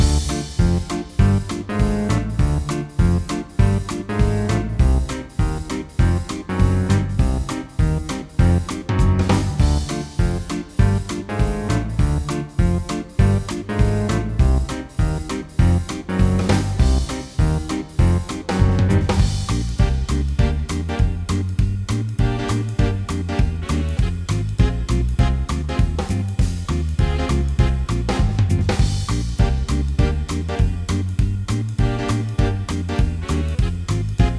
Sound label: pixel spy vs spy looping